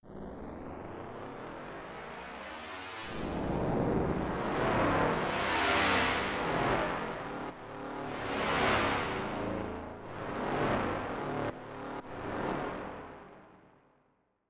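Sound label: Slower Pad, fuzz guitars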